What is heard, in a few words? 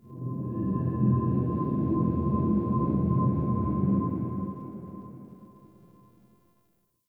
Corpus Ship Energy Low Texture Large; Textures